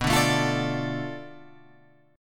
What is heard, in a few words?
B Minor 7th Flat 5th B down Guitar Open F; Bm7b5 strummed; JGuitar